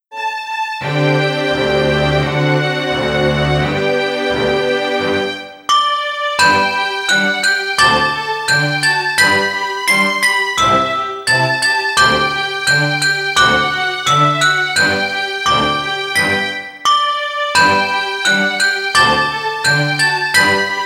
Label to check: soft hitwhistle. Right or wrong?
Wrong